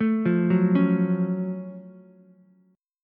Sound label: F sharp Minor Major 7th F sharp down Ukulele; arpeggiated